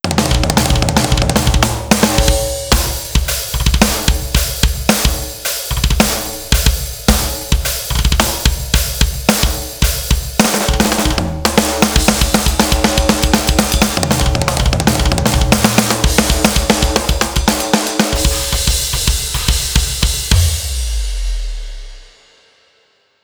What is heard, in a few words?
very metal drum